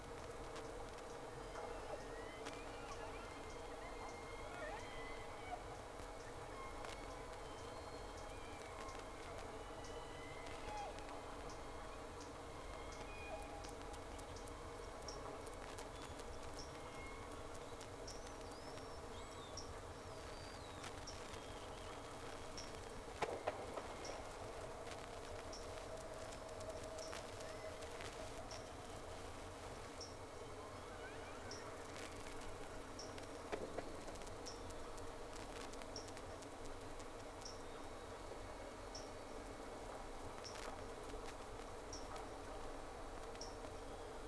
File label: Whales; SeaSnake